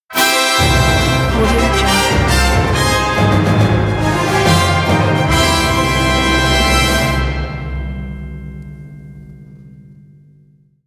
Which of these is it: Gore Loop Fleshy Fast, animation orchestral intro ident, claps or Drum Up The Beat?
animation orchestral intro ident